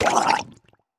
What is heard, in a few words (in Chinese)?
溺尸水中受伤声